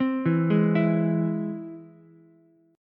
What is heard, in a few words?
G sharp Augmented G sharp Ukulele; G#+ arpeggiated; 5,4,4,7; JGuitar